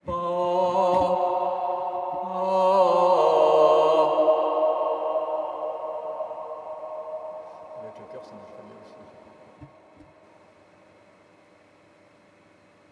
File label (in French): voix chantee dedans; LA BOULE du CEMES